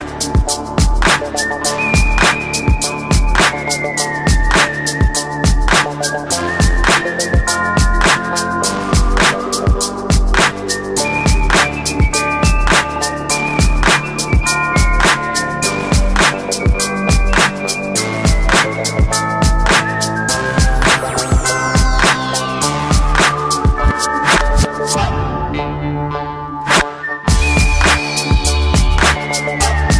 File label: Western Hip-Hop